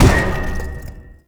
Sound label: Platform Debris; Props; Warframe; Tau; Circles Of Hell